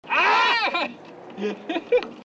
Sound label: ahh